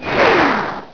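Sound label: Missile Launch; PowerPete Demo; Weapon sounds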